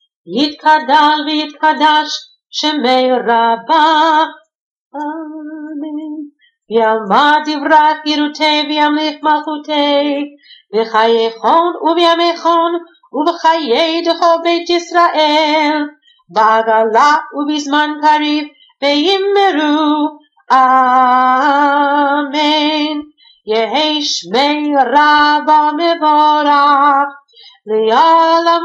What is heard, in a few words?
Full Kaddish Kaddish Shalem